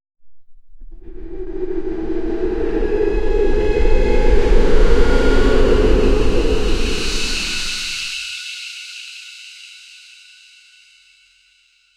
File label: slick and smooth sound